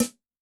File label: Dry Metal, Yamaha DTXpress-II snare